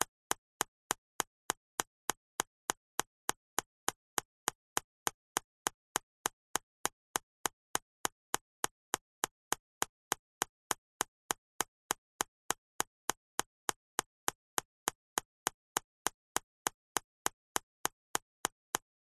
nature water drips steady fast polystyrene

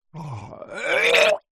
male retch short vomit; human

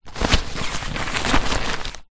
tent bag movements; foley

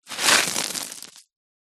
foley stones kick mound roll down

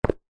foley footstep single football boot on concrete

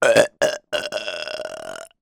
human burp rythmic

